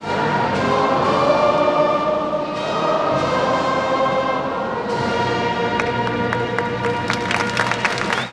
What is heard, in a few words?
Music unusual sounds; tony schwartz